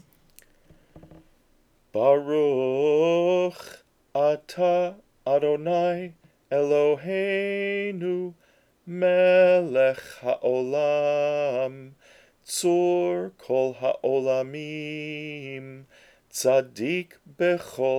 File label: Haftarah Blessing Chanted After Reading the Haftar; bnei mitzvah